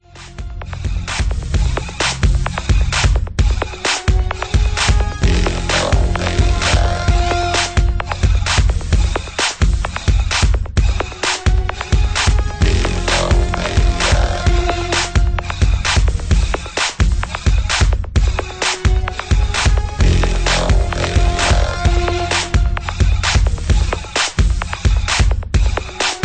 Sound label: vocoder beat